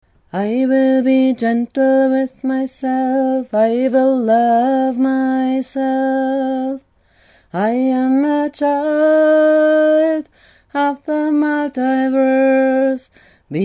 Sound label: I will be gentle sang; Reclaiming WinterWitchCamp USA 2012; Ich werde sanft sein